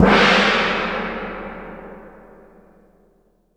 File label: 40 cymbal, gong cymbal; SAB40 CYM